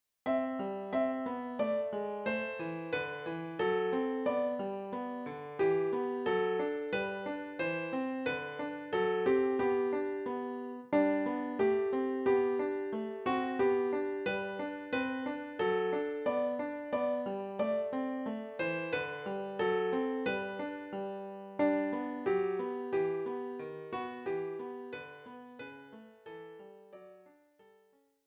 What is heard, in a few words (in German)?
Klavier; englisches Weihnachtslied für Klavier solo, inklusive Fingersätze